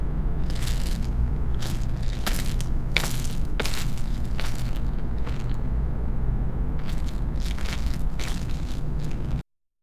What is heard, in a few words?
giant monster footsteps approaching